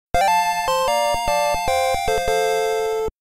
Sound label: Level Up Fanfare; Pokémon Red and Blue; SILVAGUNNER RIPS